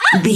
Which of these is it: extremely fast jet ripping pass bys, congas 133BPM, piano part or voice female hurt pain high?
voice female hurt pain high